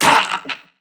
Pain, Crewmen; Warframe; CorpusCrewmen; CrewmanD

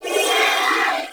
Sentient Symbilyst Pain, Barks, Enemies; Warframe

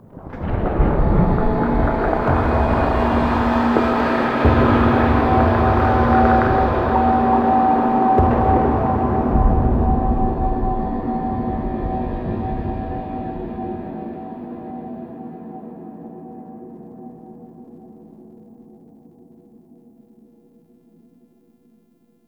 Space Amb Intro; Deadlock Protocol; Cinematics

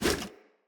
Bow Melee Whoosh; Weapons; Grand Father Bow

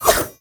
Kunai Whoosh; Weapons

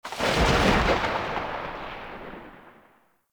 Alt Fire Tail; weapons; Warframe; Corpus; Tenet Quanta

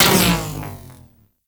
Pull Grapple On; Warframe; Mag

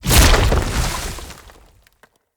Wrath Head Spike Spawn; Bromius; Abilities

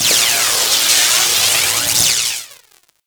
Finisher Parazon Electrify; Kuva Lich; Warframe

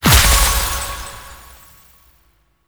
bleeding shards impact; Citrine

